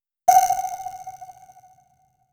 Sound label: shot sound plays in game, psychedelic